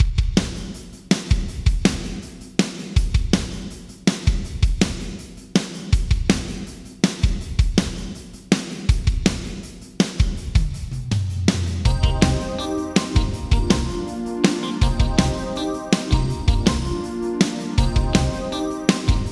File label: Drums Yamaha ProR3 Breathless Hall 1.2s PD8ms; Vintage Digital